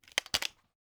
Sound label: movement shotgun; gmod; arccw uc commons attachments